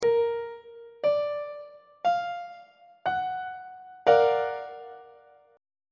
g flat augmented major 7th 1st inversion on treble clef